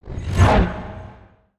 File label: asteroid whoosh by